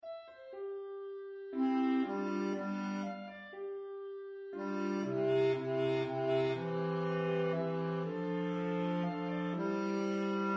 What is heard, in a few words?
Benedictus CHORUS Bass